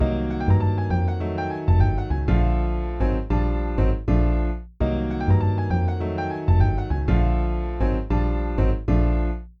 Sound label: ii-v7 combinations